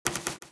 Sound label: Otter Run; Seer; GamigoNA